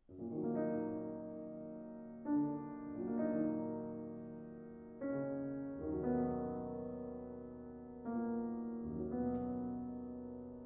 Trio For Violin, Horn & Piano in E flat Adagio Mesto